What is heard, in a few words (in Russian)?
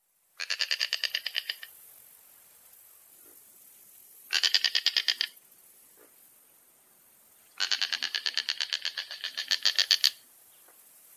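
korshun slizneed, snail kite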